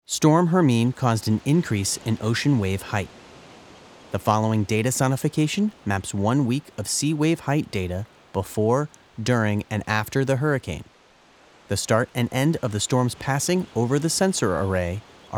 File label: Wave Height Sonification; Storm Hermine Audio Display